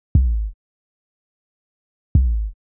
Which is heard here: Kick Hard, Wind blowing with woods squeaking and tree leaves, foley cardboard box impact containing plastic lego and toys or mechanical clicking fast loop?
Kick Hard